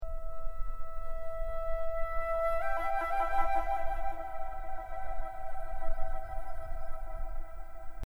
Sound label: tremolo no harmoniser